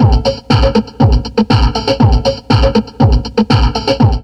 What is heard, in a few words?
Drum Loops Crazy Processed